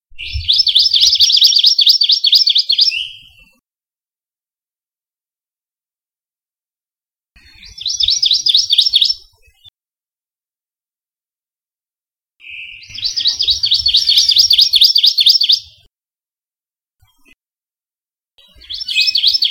amakihi; Saddle Road Birding

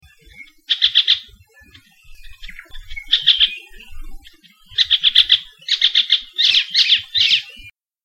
elepaio; Saddle Road Birding

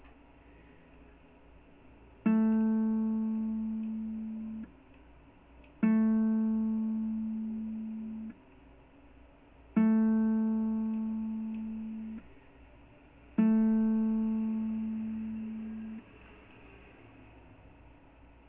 Low A tune, ukuleles

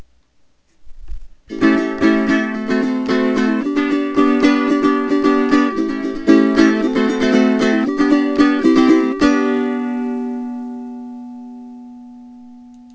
6 tnr str, ukuleles

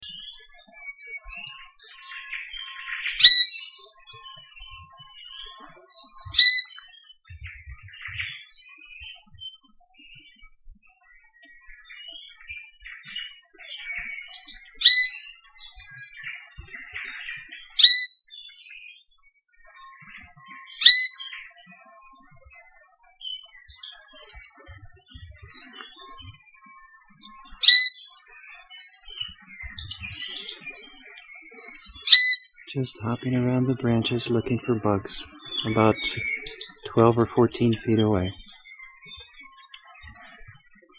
iiwi long; Saddle Road Birding

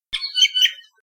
iiwi call; Saddle Road Birding

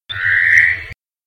omao call; Saddle Road Birding

